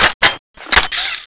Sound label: m134 overheat end; sven; custom weapons; cso; m134hero